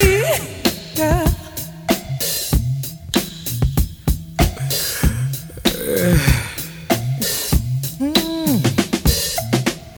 96 bpm modern drum beat c key

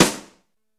ambient acoustic snare e key

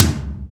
tom sample c sharp key, Loudest frequency: 3215Hz

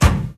rich high end kick drum single hit e key

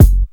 old school hip hop bass drum sample g sharp key

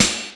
sparkle steel snare drum sample a sharp key

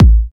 strong bottom end bass drum one shot a key; kick

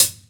dry pedal hi hat c key; tuned drums

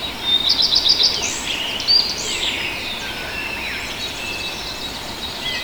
early mountain birds habitat; soundscapes

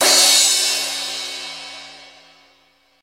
cymbal sound c sharp key

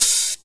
crispy open high hat g sharp key

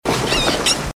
rattling groans; people

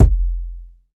large room kick drum sample f key; kick drum samples

